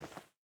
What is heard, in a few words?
footstep hide dirt walk; Rust